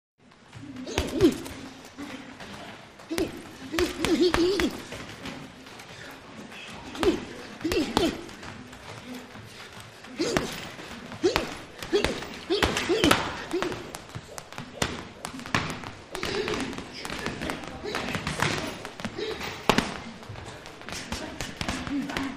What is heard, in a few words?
Boxing Gym Ambience